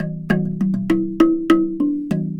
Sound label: Box Drum Perky, Worldly Rhythms; Sonic Foundry (Sony Creative Software); Ma Ja Le Ethereal Textures